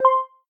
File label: bonus; 99balls